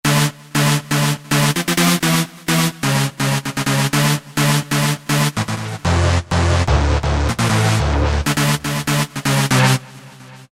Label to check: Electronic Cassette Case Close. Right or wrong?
Wrong